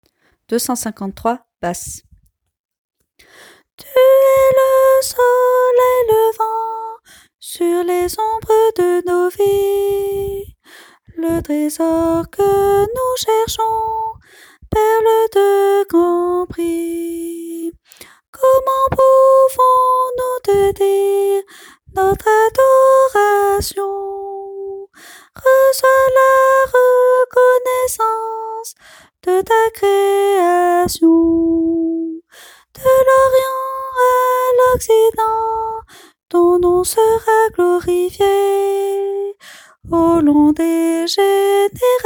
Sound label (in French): basse